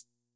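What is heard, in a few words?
closed hi hat, univox; classic machines; concertmate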